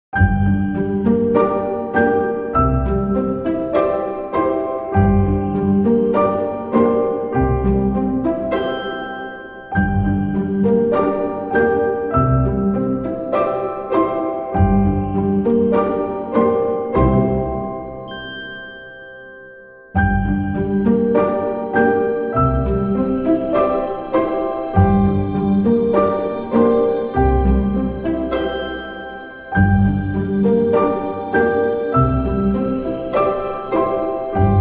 Magical Fairy Tale Background Music For Videos Medieval Fantasy Music Atmospheric Ambience